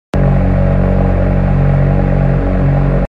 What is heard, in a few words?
GAC EMPOW